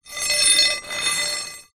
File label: foley metal rod scrape on ground